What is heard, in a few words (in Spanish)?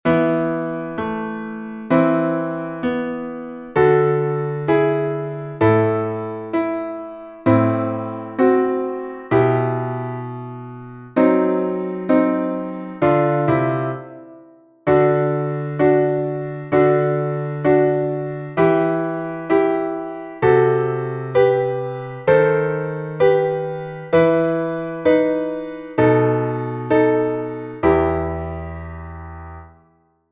entonacion piano